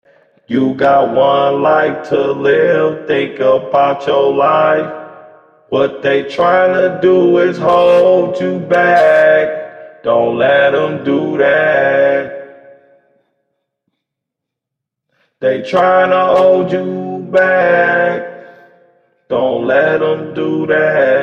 hip hop 91 C sharp, Acapellas; Hold You Back

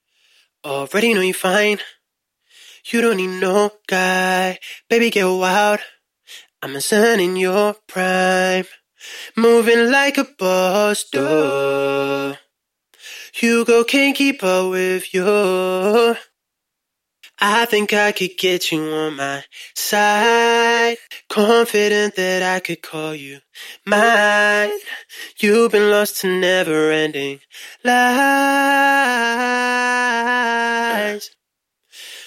POP 120 FM

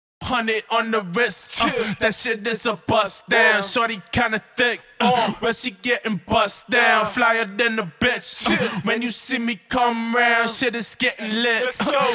HH 140 Bm

